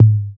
Low Tom Drum Sound A Key, tome drum sample tuned to the A note